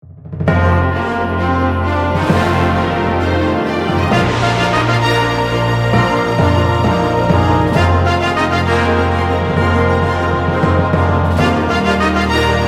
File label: BGM opening; dungeon magnagate